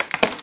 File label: wood strain; physics